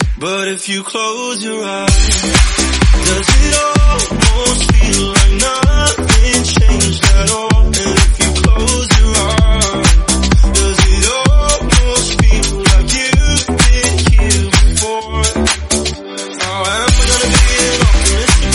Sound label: House, 128bpm